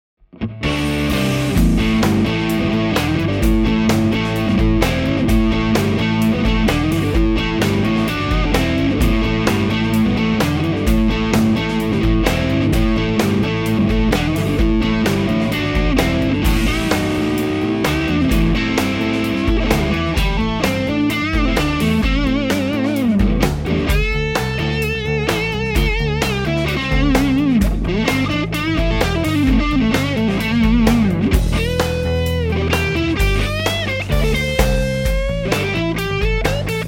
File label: Dist Neck, Strat; schwarzbach; VP-Strat-IrishTour